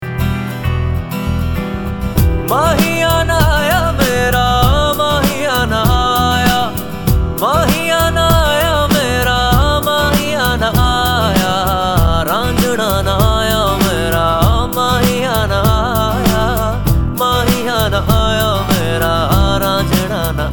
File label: ringtone